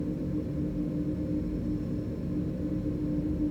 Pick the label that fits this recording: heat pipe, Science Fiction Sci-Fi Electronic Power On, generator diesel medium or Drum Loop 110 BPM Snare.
heat pipe